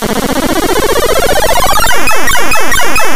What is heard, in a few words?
Wave Start; ringtones; Notifications; Robotron